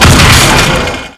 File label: heavy crash, vehicles